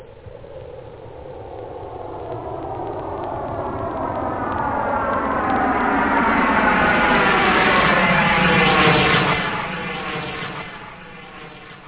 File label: stellar, whoosh; da capo vol1